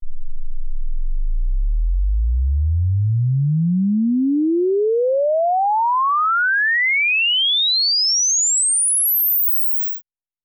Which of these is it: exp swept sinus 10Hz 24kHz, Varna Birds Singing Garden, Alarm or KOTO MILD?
exp swept sinus 10Hz 24kHz